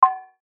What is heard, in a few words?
button high tech